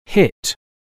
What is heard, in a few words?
hit